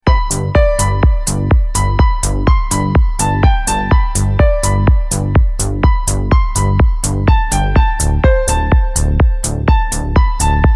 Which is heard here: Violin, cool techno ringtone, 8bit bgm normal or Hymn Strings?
cool techno ringtone